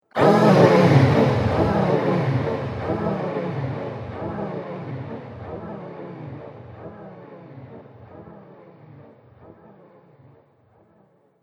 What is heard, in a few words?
Alien Sex Sound, alien sound effects